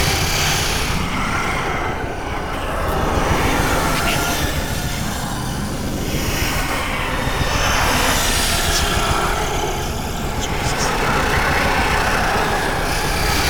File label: Drain Loop; Vampyric Liminus; Warframe; Entrati; Conquest